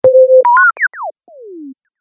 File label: Bonkers Beeps; Beep Sound Effects